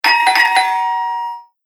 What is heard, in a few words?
Old Doorbell Double Press; foley